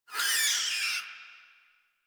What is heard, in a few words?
Forest Rodent Vocal Death; Warframe